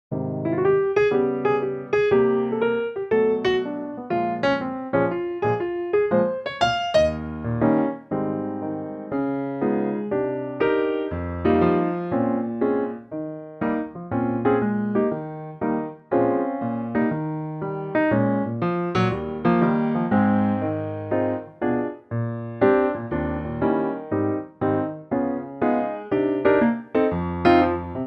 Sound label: Ab piano